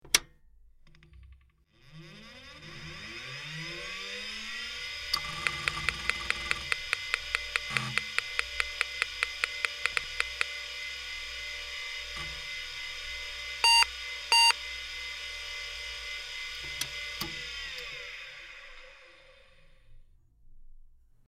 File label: Pc Startup Aborted, hard drive whir